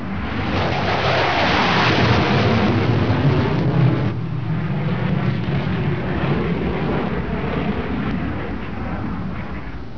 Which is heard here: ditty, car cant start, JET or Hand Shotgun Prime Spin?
JET